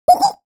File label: Surprise; BEARTANG; Eft